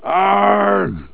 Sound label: argh, HORROR